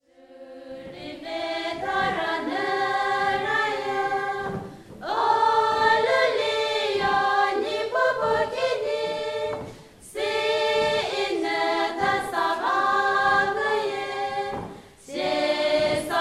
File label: song